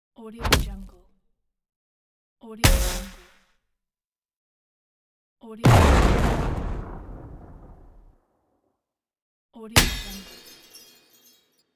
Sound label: hit